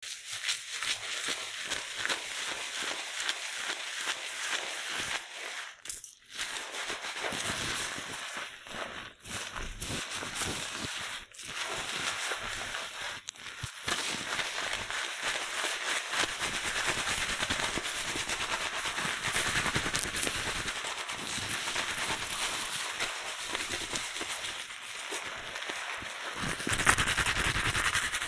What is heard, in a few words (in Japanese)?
シャンプー 声なし; ツンデレ幼なじみのすぺしゃるヘッドスパさーびす！